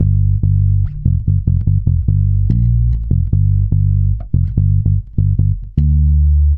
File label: RAGGA G, bass; Spectrasonics; Bass Legends